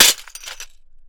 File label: Window, Small, Crack, Medium Impact, Bright; Shards Broken Glass; Chris Skyes